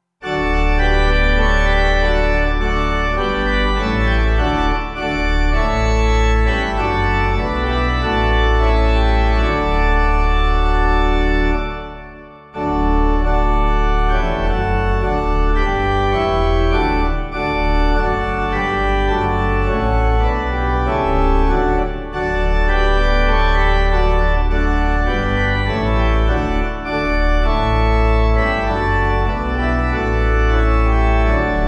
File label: Glory To God, Samson, Pipe